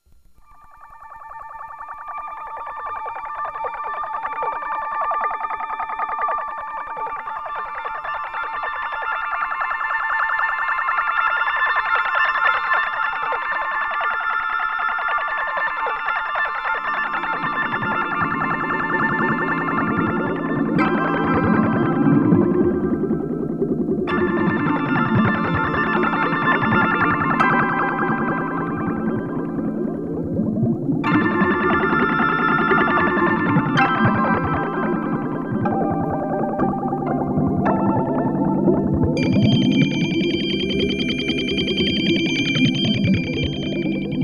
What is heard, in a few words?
xfade, multiphase; Scott Stites